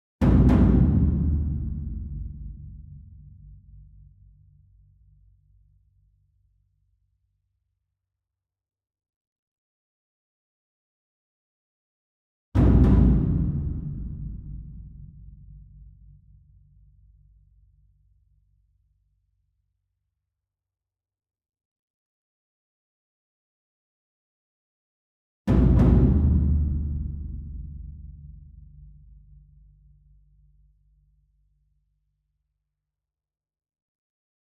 ENSEMBLE DRUM Bass Drum Concert And Taiko Double Far; boom library; Cinematic Strikes Construction Kit